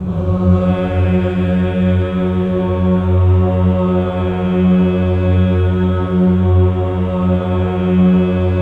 VOWEL; Spectrasonics; Symphony of Voices; OCT VOWEL

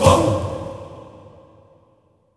ISAIA HIT1-L; Spectrasonics; Heart of Africa 2

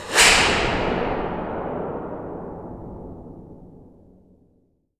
BRUSH STEEL; ENVIRN-AMB; Rarefaction; Poke in The Ear With A Sharp Stick

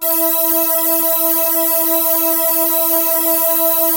WIRE BRUSH; Greytsounds - Scott Peers Sound Engineering

